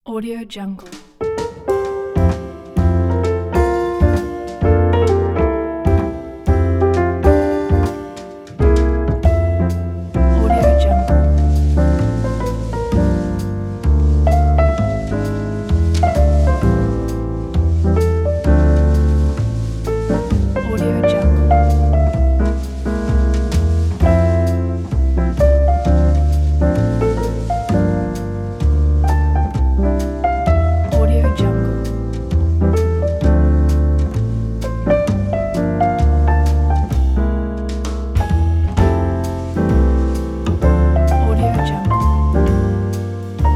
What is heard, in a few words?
jazz trio pack; blackbirdsound